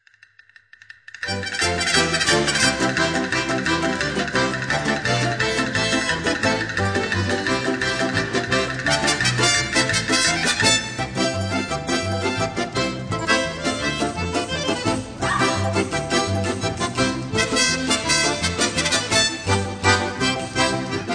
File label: instrumental